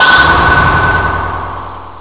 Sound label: metal